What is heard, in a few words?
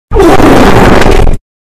Distorted Fart Agressive